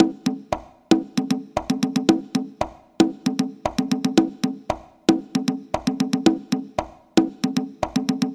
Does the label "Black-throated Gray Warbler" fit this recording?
No